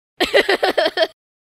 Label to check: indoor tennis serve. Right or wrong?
Wrong